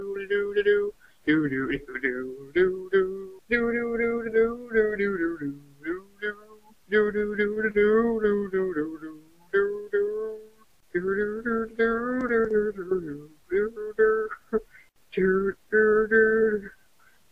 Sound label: Ring Tone; Podcasts; MailRoom